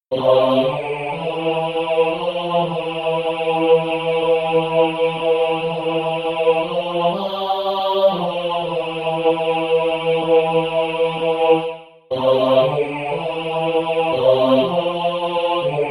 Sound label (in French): basse; o ni ssa wu re